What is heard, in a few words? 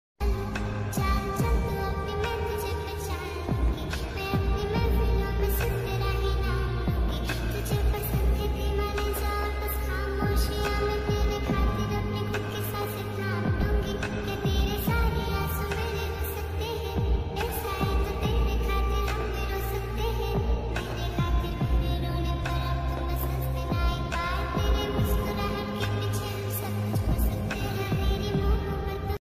mood off ringtone